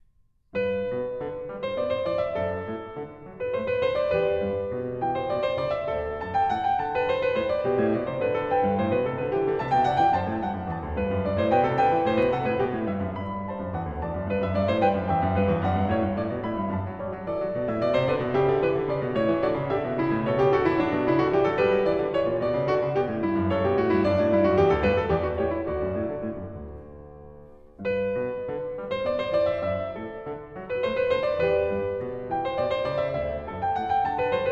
Bach Goldberg Variations BWV988 Variation 3 - Canone All'Unisuono